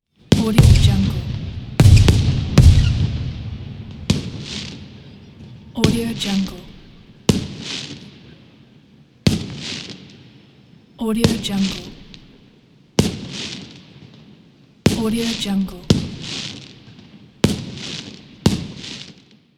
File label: firework